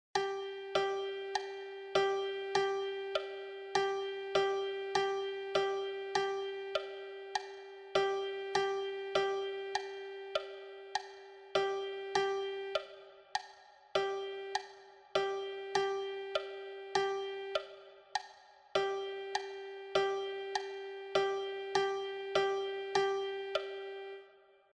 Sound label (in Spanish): percusion